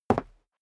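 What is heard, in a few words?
step sneaker concrete single left, footsteps; schritte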